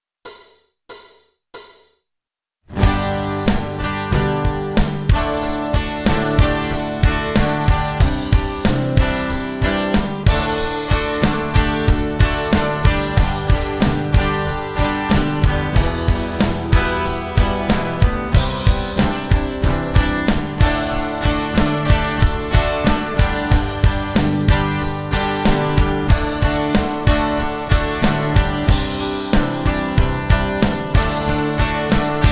acoustic guitar; haaks vineyard and winery

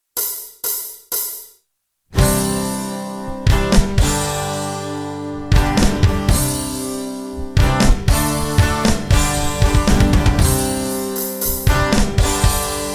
music acoustic guitar; peter piper pizza

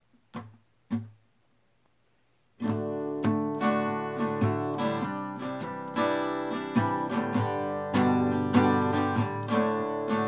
acoustic; minuteman press of pearland

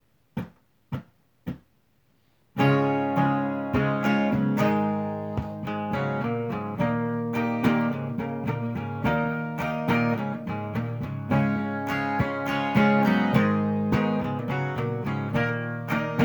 acoustic; pura vida multiservices

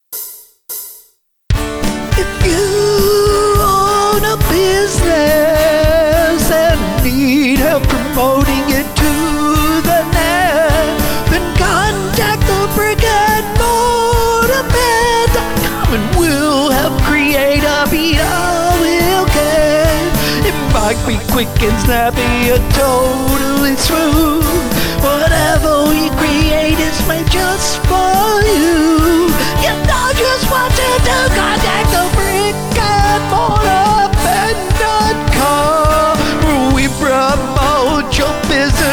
male; Andy's Hawg Wild

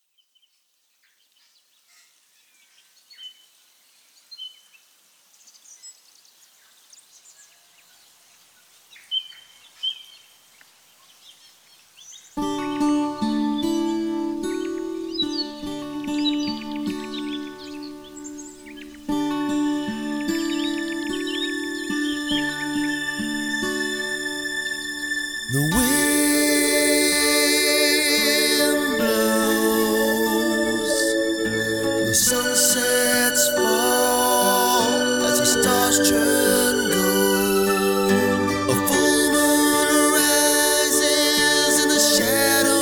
male

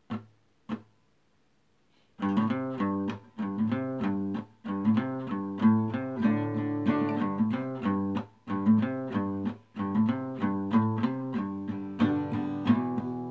acoustic; el oasis

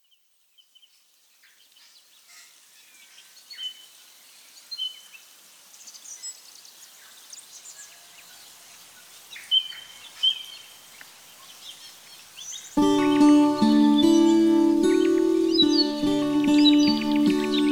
guitar

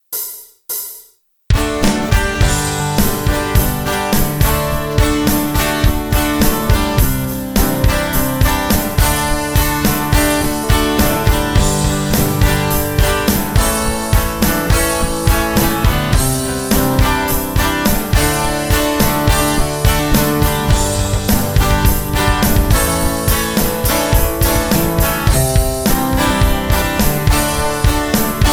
acousticguitar; Andy's Hawg Wild

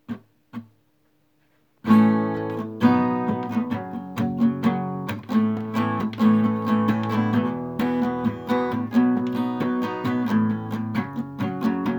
acoustic; el torito country cooking

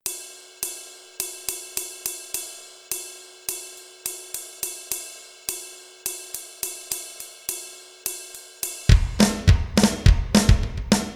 music drums; one living church

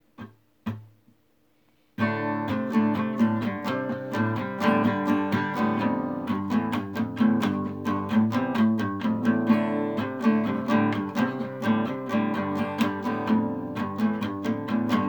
music acoustic; baleadas express restaurant

